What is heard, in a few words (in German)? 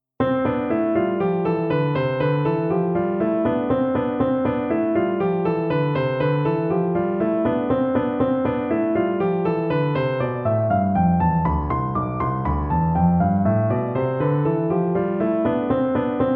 Tonleitern Spielen C Dur, C-Dur-Tonleiter über 2 Oktaven; einzeln und zusammen